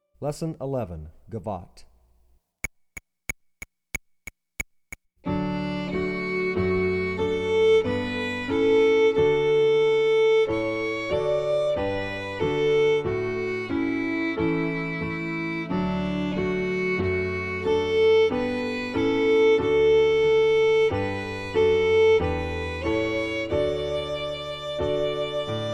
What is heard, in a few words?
gavotte